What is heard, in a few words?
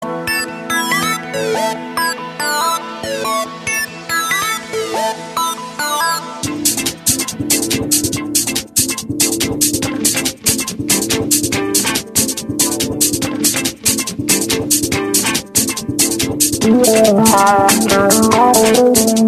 electronic huqa